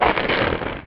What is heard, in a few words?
minifier shrink; garrysmod